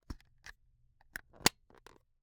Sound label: Camera, Kinusa K77, 50mm lens, plastic, film compartment, open close, creak, pop; Eiravaein Works; Vaeyan IV